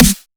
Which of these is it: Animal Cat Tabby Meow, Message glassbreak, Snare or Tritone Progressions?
Snare